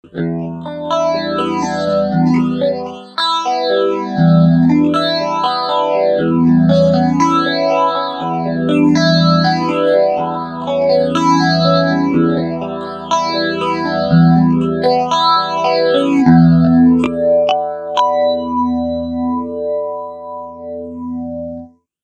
Flanger Picking

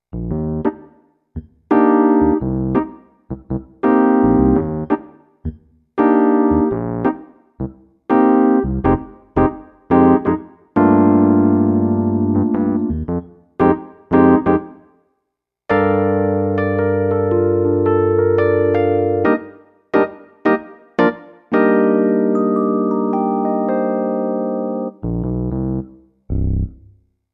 Yamaha P-515 Stage E-Piano

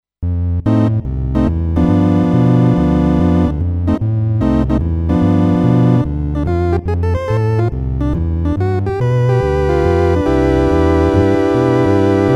Roland VR 730 Organ

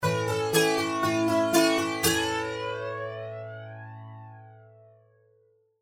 Flanger; PCM81

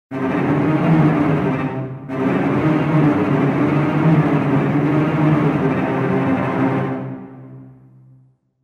String Ensemble Cello Tremolo

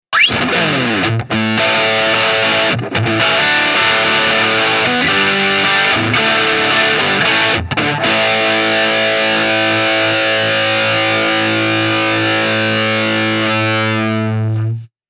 Punk

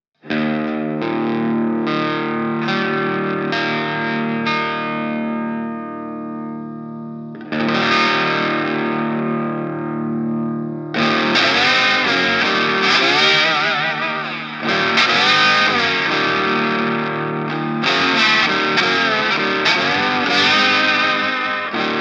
Bottleneck open D Blues

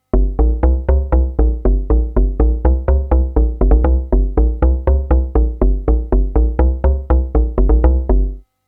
Woodbass

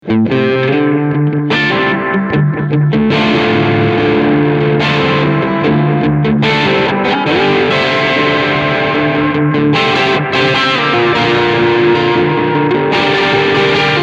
short 16tel Overdrive

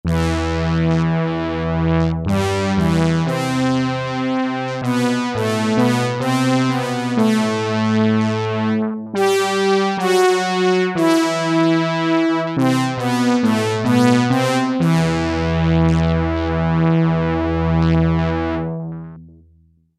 Roland JP08 Dual Mode

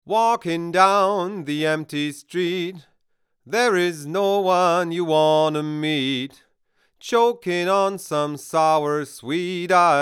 SPL Crescendo duo Vocal U87 36 dB Gain

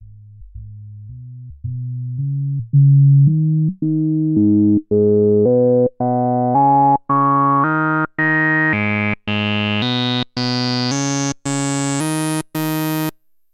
Genus 3 Poles; Rossum Electro Music Evolution

